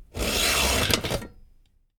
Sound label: STEEL, SAWING; 1000fx